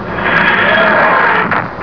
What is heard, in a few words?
Start Windows